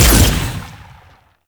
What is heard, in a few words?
Fire Shot; Warframe; Weapons; Duviri; Incarnon Adapters; Incarnon Dex Sybaris